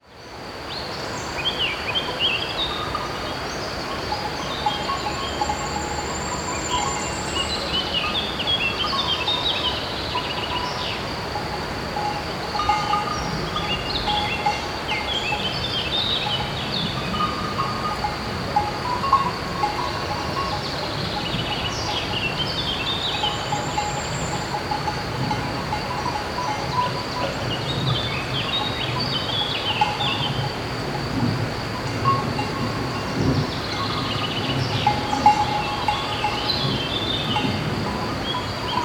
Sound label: nature ambience countryside birds insects cow bells distant traffic wind; wind in trees